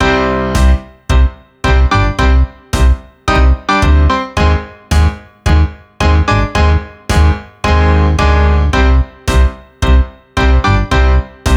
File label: Tango; Lucius III; pc linux